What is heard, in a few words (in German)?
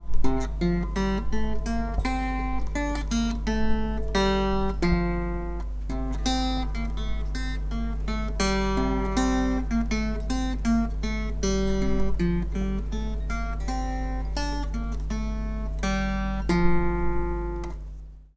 Sound Gitarre; blaeserjugend; musikmentoren